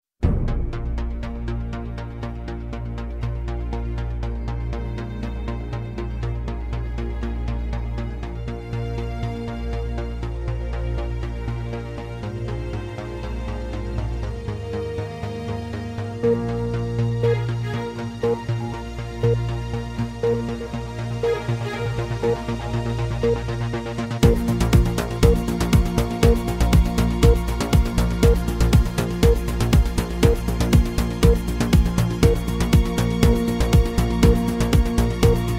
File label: remix